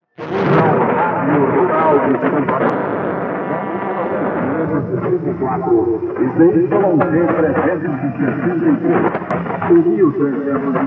tpdx 4765 khz; emissora rural santarem

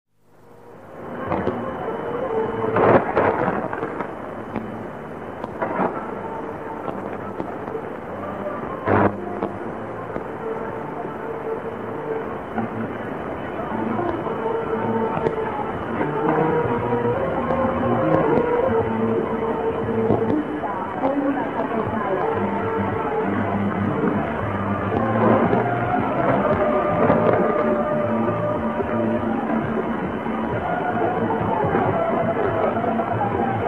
onda tropical chitre 1200 khz, mwdx

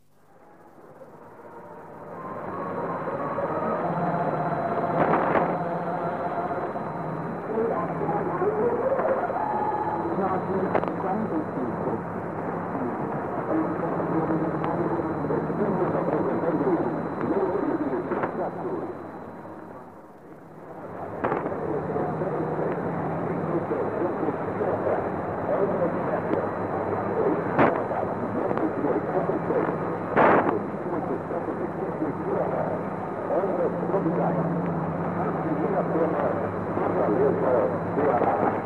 mwdx 1300 khz; r iracema fortaleza

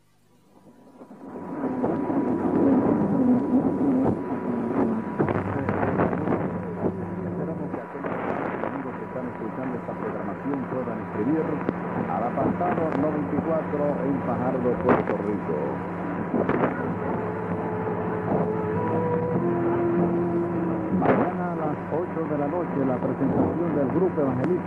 mwdx 1480 khz; wmdd fajardo

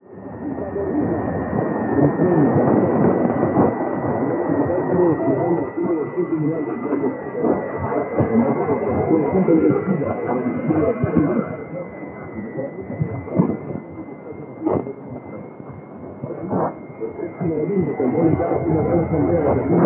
mwdx 1005 khz; r colosal neiva

